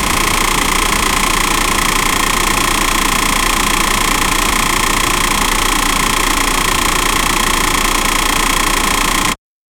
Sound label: propeller engine sound, airplane